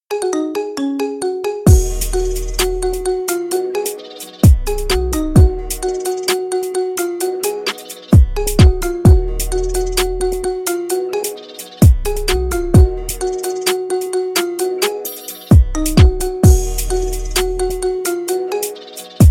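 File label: marimba remix ringtone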